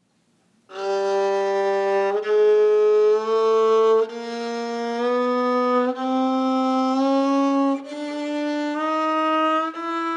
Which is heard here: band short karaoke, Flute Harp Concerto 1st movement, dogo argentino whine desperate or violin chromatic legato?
violin chromatic legato